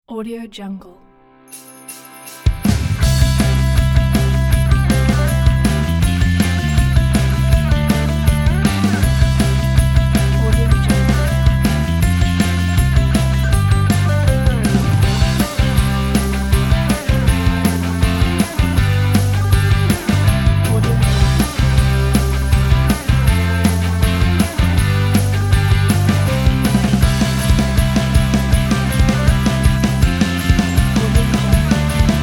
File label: jingle bells; blueastral